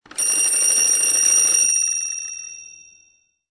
antique phone, ringtones

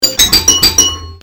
Start, pinball

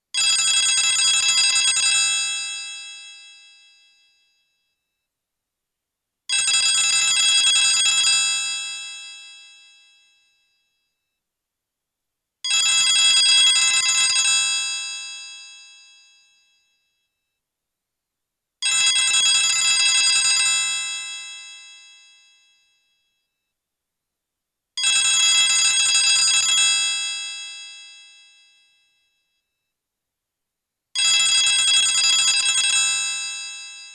Old Russian Phone; old phones

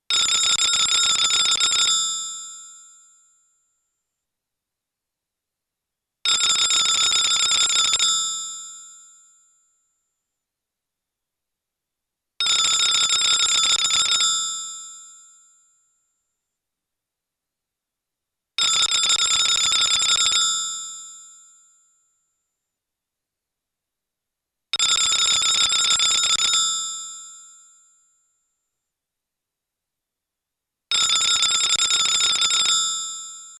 Old British Phone; old phones

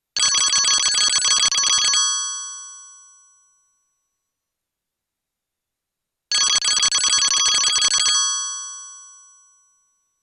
Old Chinese Phone; ringtones; old phones

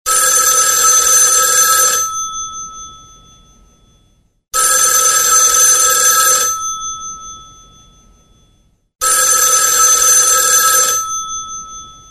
Analogue Telephone; old phones

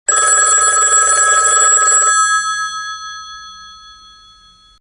Old Telephone, ringtones

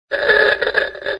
Automobile Horn; old phones

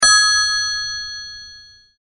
Short single ring, old phones

